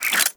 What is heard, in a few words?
Hand Shotgun Spin, Weapons; Hand Shotgun Prime